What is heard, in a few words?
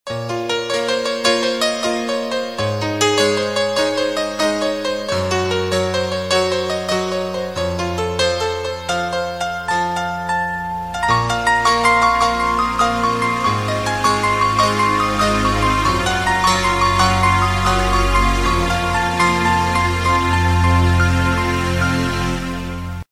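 piano beautiful ringtone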